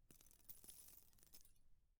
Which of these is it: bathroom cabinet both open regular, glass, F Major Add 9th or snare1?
glass